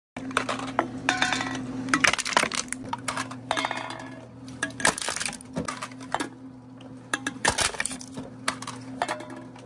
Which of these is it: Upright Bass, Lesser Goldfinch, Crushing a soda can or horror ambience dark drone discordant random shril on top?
Crushing a soda can